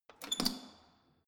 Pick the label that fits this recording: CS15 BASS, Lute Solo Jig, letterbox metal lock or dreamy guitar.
letterbox metal lock